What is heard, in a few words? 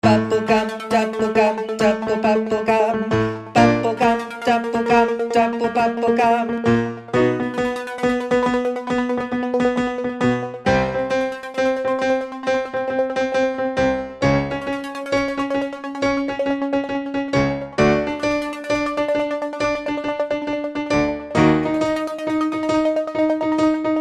Backing